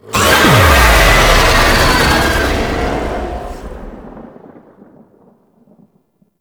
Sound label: Boss Vocal Transition Stinger; Enemies; Vocals; Warframe; Oraxia